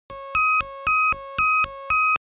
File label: sci fi alarm small personal beep